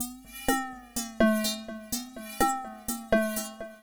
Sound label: XTRA HOUSE 125